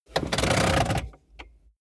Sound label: car bmw e46 interior seat pull forward completely rattle